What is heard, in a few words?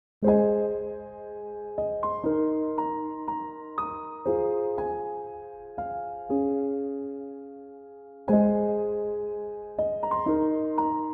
chill piano instrumental